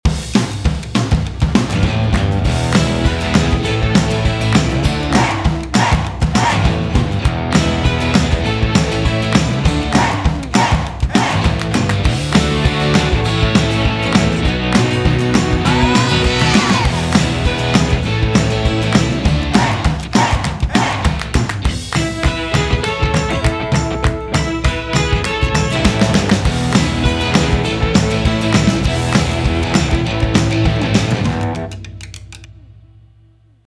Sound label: Clap Yer Hands